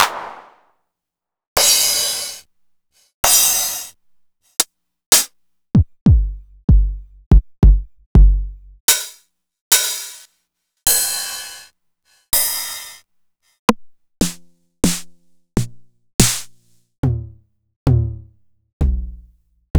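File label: Roland MV8800 drum DIRTY; DIRTY 9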